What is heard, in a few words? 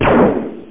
Dual Shot; SO2K